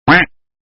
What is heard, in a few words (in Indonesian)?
nada notifikasi pesan masuk bebek